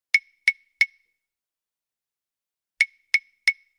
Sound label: Claves